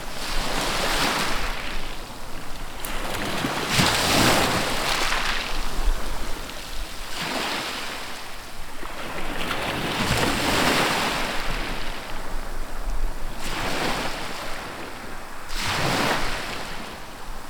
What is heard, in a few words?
ocean waves hi fi field recording